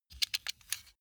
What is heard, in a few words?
sunglasses metal take off